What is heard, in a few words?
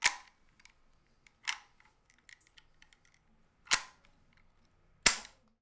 Gun Barrel Near Mono